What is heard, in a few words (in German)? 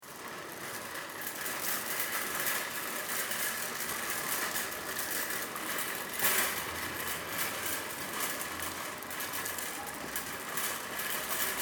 Supermarkt Einkaufswagen